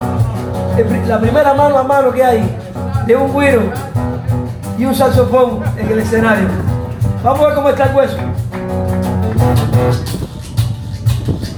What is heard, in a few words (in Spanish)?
solo guerra de saxofon y guiro